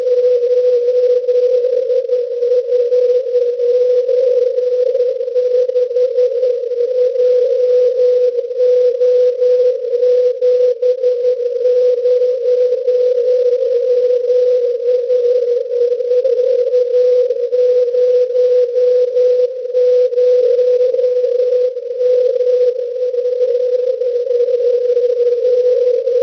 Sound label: cw